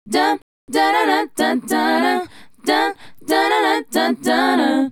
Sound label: Female Group, Hip-Hop and RnB Vocals